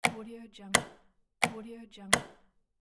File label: clock tick tock